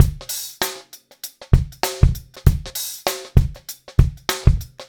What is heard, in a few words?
098 Pop Drums; Pop Rock; Moderate Tempo; Pro Tools Essential Loops; Digidesign